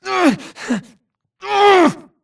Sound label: grunt Struggle; Titanfall 2